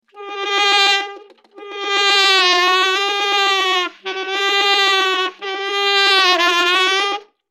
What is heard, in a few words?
Mosquito Sound